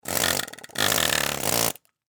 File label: Industry Air Hammer Operate Impact Cinder Block Long; Hammers